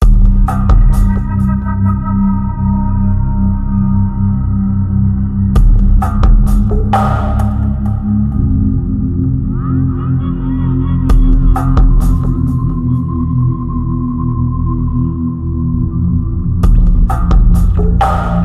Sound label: BGM FOREST; Death Jr. II Root of Evil; PSP